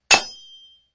Sword swing hits object with a thud

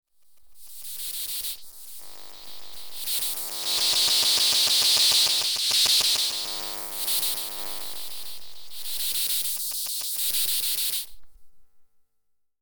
Laptop glitches and noise

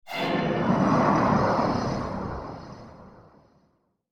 Horror accent eerie hiss

